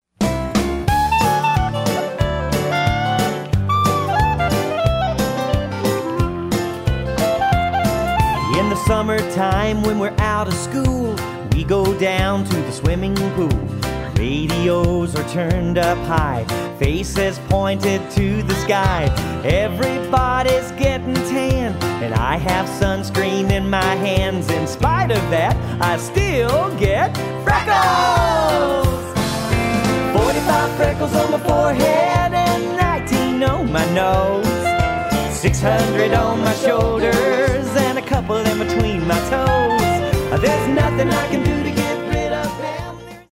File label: Two Beat